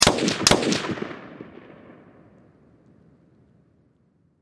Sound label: Warfare Machine Gun M16 Double Shot Distant Perspective